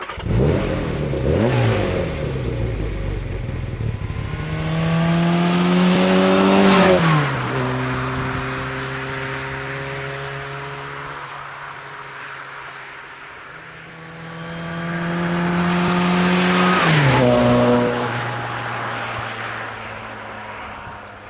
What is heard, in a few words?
Morris Mini Cooper S MKII